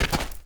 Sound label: dirt land, footsteps; chief